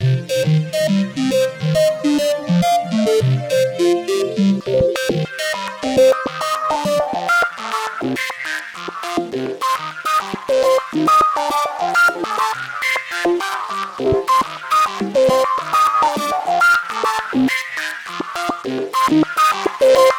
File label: Waldorf 2 Pole Synth Sequencer Rectify